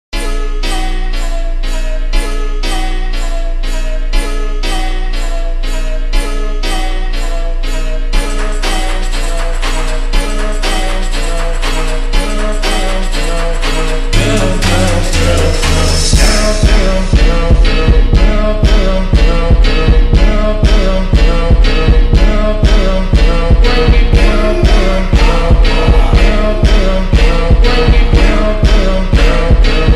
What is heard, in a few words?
slowed reverb